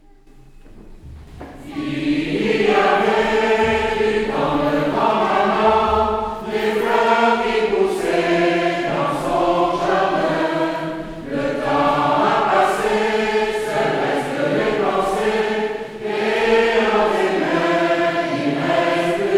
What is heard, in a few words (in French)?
Chorale